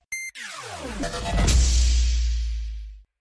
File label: stab